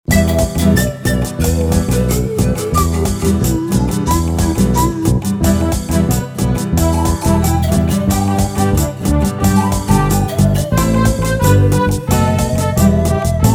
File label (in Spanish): Pista con melodia instrumental